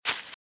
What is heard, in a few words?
Velociraptor - Footstep - Grass - Run - Left; RadwinIsland